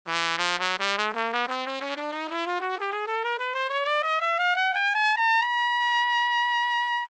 corn

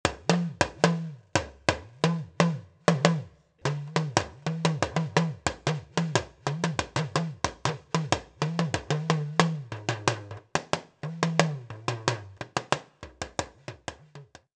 Kalangu solo

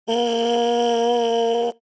baritone mouthpiece

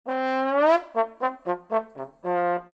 tromb

